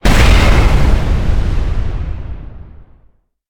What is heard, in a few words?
explosion